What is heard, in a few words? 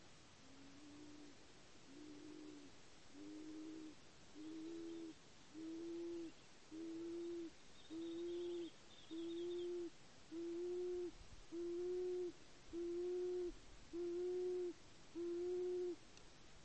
Plains wanderer